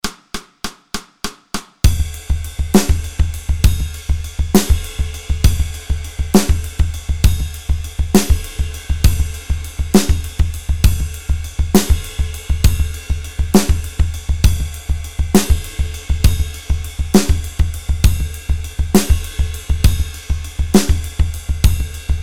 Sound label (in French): Drums butee groove tempo 100; Grooves butées